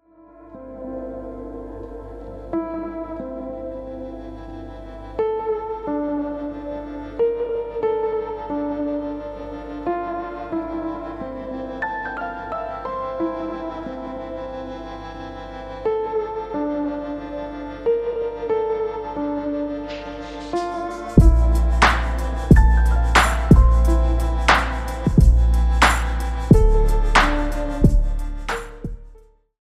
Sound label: Beat